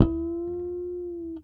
Harmonic Bend One Shot F, Bass; Bass X

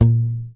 YAMAHA 5 MUTE A#2, Bass

